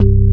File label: BSE C, BASSES, House